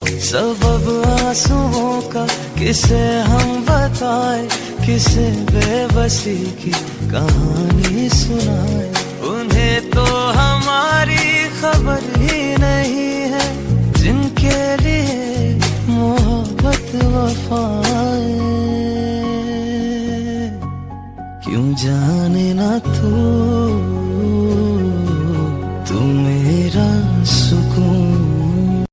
pakistani ringtone; dil E Gumshuda Ost

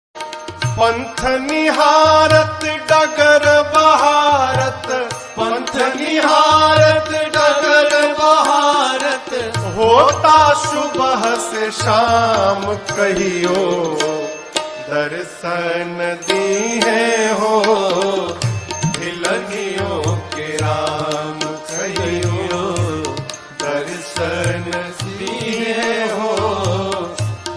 bhakti ringtone

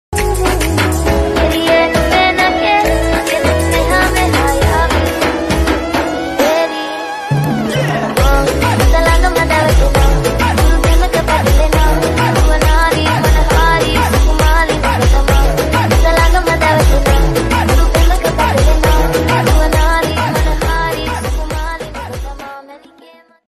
dj remix, tamil ringtone

